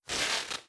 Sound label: reality breach spark; Stellaris; nemesis